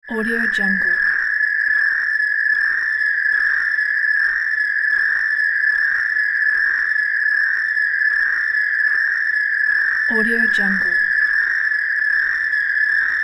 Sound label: high cyberspace signal